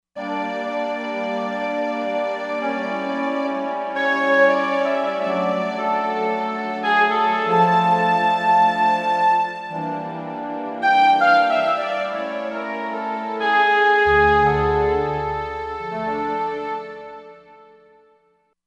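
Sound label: Wind & Strings, Roland Fantom XR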